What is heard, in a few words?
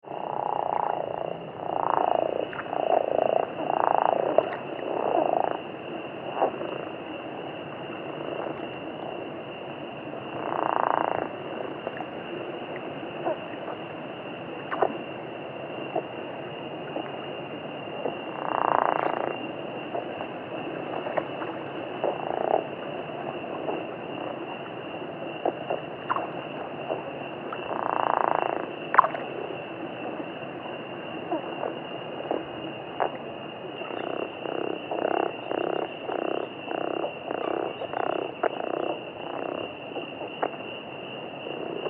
r chiricahuensis